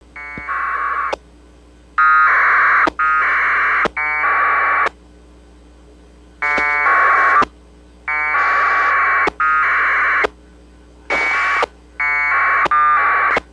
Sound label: aprs, 144390MHz